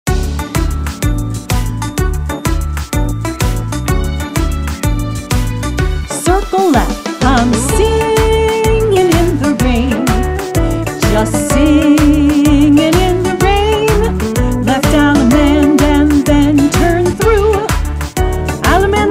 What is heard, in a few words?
vocal sample